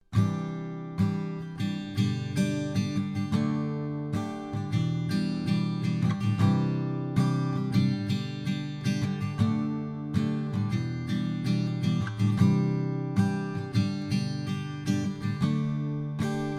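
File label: TLM103 strummed guitar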